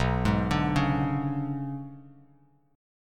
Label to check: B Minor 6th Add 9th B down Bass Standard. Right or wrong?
Right